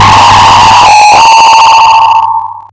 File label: noo noos scream